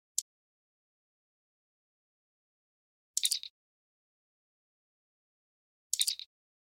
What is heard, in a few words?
Communicator Chirp; star trek